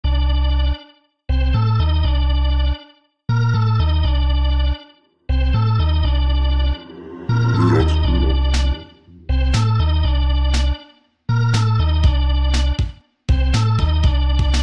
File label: Scratch Show